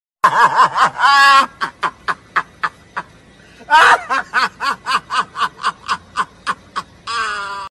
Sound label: Man Laughing